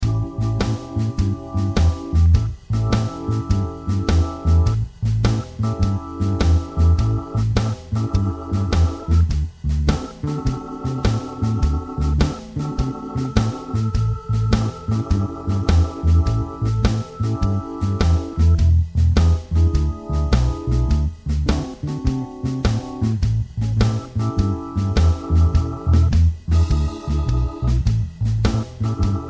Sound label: Bluesbreaker Backing